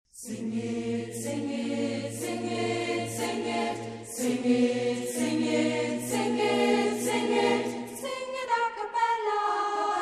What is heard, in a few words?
sing it acapella